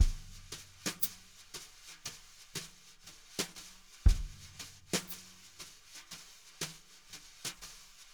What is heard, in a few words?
jazz brush loops